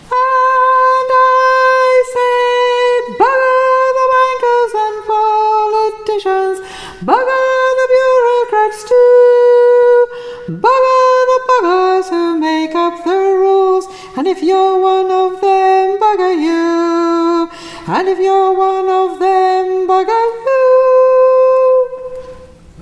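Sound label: soprano Chorus, singers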